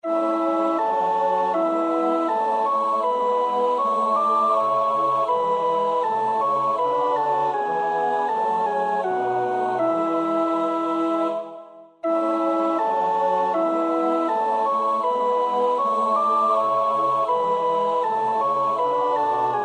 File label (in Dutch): sopraan; Reger (Max); 7 geistliche Volkslieder